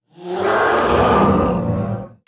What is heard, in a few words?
Monster Bellow Growl